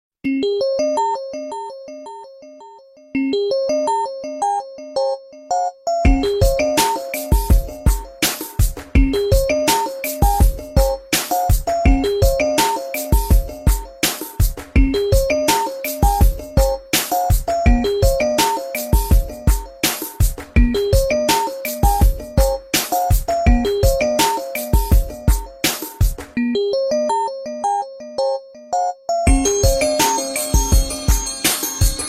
Xperia Alarm

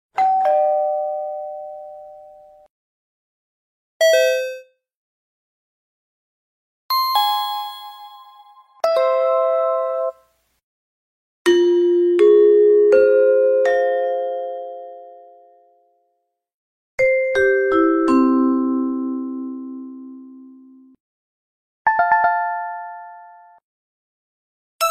Ping pong sound effect

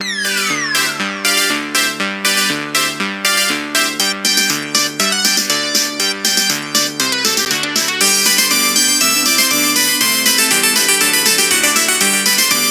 Electronical Parade; ringtone

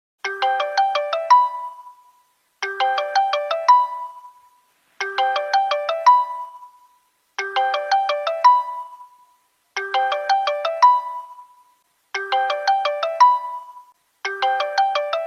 LINE Free Calls

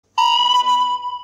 Kzurb sonar

